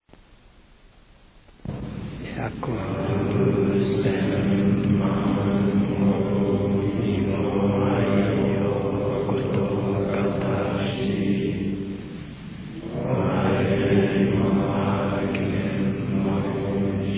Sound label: chant